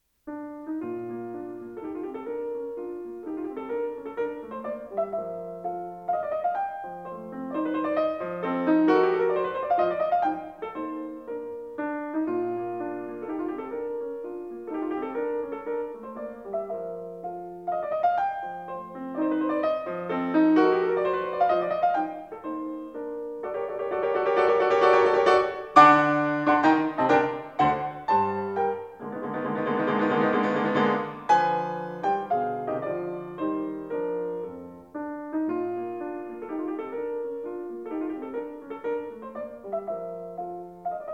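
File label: Piano Sonata No. 11 in B flat major; Wilhelm Kempff; Beethoven; Piano Sonatas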